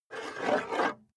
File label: metal scrape on wood